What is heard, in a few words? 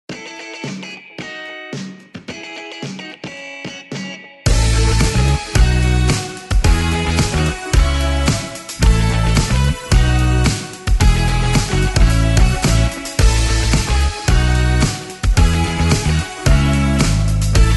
rock pop